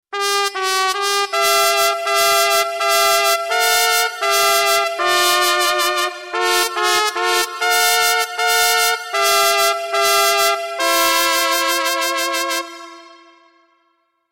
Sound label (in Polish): trąbka